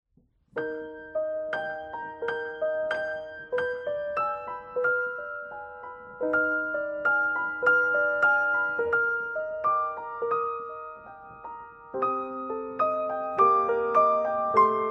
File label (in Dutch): Arietta - Pianoversie